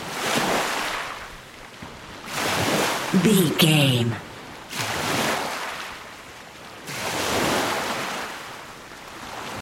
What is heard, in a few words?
beach ocean waves water loop